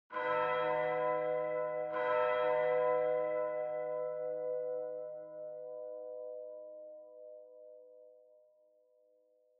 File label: Church Bell Far Low Mid